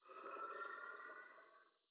metro

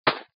splat soft

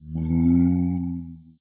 moo

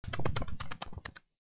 fly eat

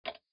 button pressing

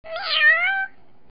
meow

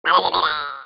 goblin

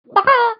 chicken baka

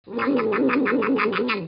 Cookie NOM NOM

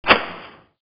paper

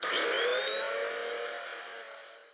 vacuum

